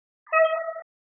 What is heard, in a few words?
horn